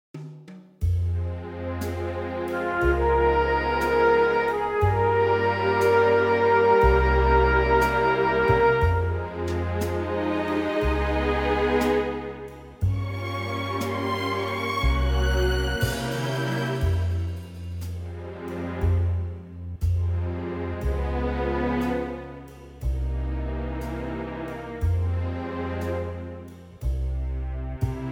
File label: orch Fm